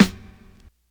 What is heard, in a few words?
long room reverb snare one shot F sharp key